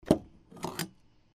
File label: fuel over